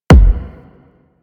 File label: Grenade Launcher Sub; Grineer